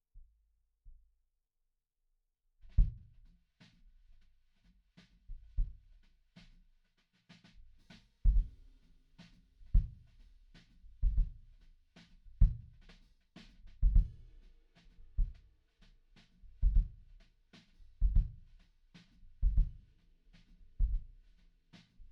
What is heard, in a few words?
Kick In